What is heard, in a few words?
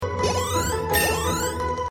exp up; Pokemon Let's Go Pikachu; gamesoundopedia